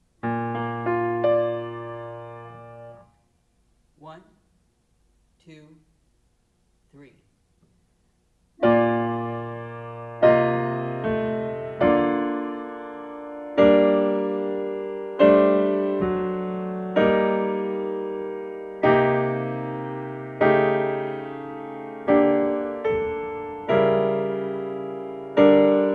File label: aural harmonic